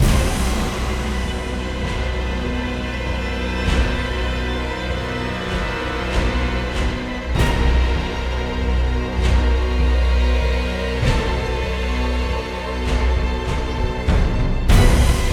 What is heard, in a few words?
Bossfight Stage Action; ryse son of rome 2014